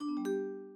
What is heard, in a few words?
notification sound